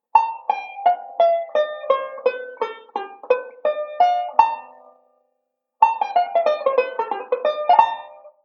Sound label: Modal Sequence, banjo